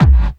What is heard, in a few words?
KICK hardcore, Techno Trance DRUMS